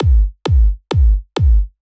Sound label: Kick